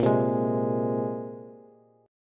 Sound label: Bb 7th Suspended 2nd Bb Bass, chord; x,13,10,13; Bb7sus2 strummed; JGuitar